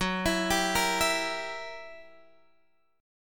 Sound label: F sharp 7th Flat 9th F sharp down Guitar Open G